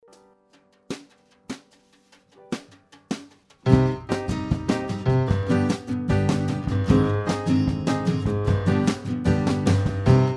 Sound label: Emerald Green Bass